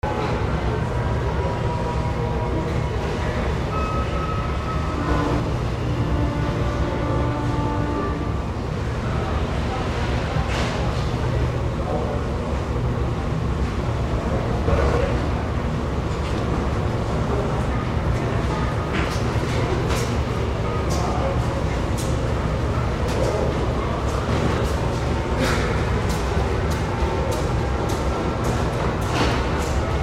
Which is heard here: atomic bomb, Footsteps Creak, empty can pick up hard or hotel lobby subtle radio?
hotel lobby subtle radio